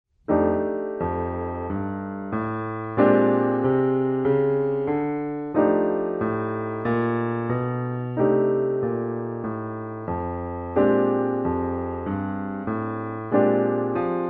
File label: Accomp